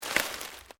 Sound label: LEAVES DEAD MOVE, Rustling